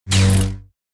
lightsaber clash

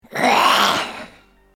breath

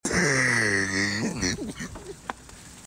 car wheeze short

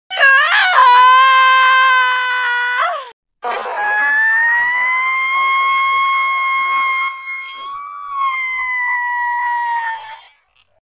female scream

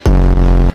bass drop; from ranking videos

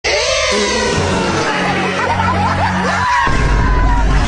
eewww

